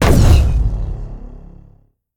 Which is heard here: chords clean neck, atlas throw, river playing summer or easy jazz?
atlas throw